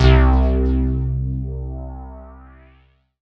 Dance Mega Synth; Best Service; 90 s Sample CDs

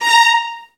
VIOLINS, Synclavier Strings; ILIO

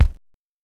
Oberheim DX

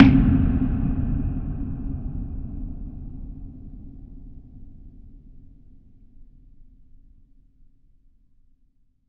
WATER TANK, Impacts, Hits & Booms; Distored Reality

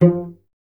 STR VC F 1, Cello; Miroslav Vitous; Solo Instruments VOL-1

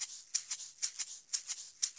Eggs 120; Best Service; Festa Latina VOL-1; Drums & Percussion

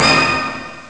orchestra; ST-XX Amiga Soundtracker Sample Packs

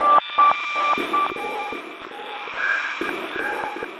Rhythmic (120BPM); musicradar

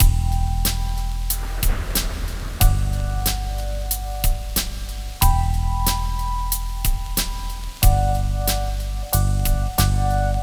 LOFI; USB Soundscan vol.47; AKAI; Hip-Hop & R&B

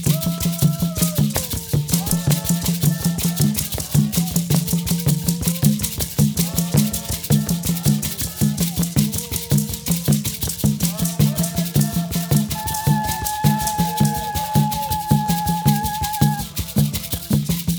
REJOICING; Spectrasonics; Heart of Africa 2

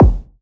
BD, BASS DRUMS; EdgeSounds

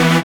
SYN DANCE, Roland; Sound & Vision - Gigapack II

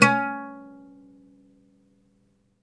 OUD C#3, Plucked Strings; E-MU Producer Series Vol. 7 – Old World Instruments

